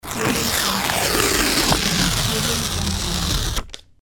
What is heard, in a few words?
paper tearing